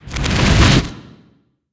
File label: boulder conjure; Fate The Cursed King; SPELLS